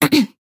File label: Nonverbal Awkward; Aurora